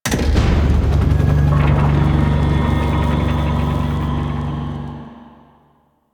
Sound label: Windmill Power On; Duviri; Power Grid